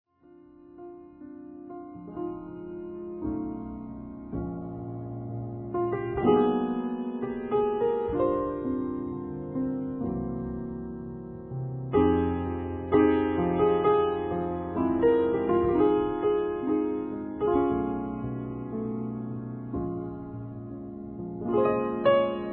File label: Blues